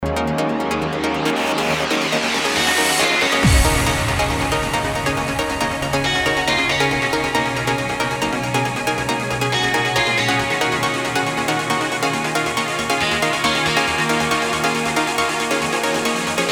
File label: Trance